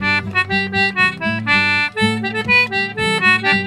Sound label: G C4, Celtic Flavours; USB Soundscan vol.42; AKAI